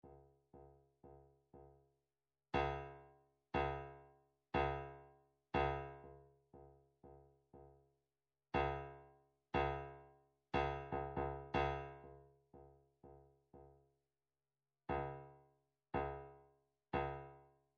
drum trax stic

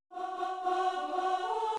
Nintendo 64 Course Start Sequenced, midi; Super Mario 64

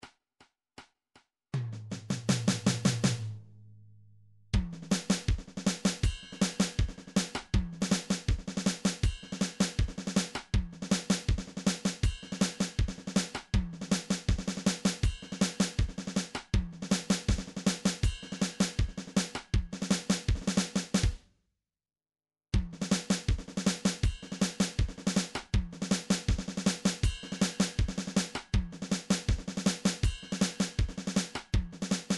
blues 160 snare train; Sonar

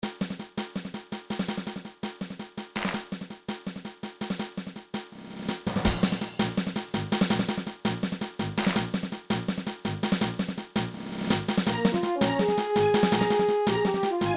battle; gameboy; final fantasy adventure; mana tree

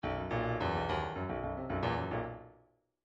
midi file grooves funk

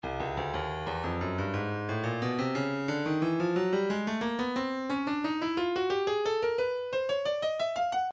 breakbeat midi files 115 119 bpm 119; Rat Pack A; Stylus Breakbeat Midi Files

